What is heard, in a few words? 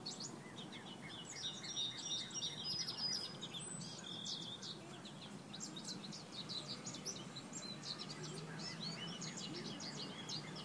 spring chirps